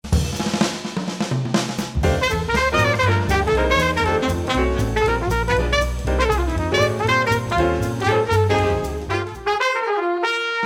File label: jazz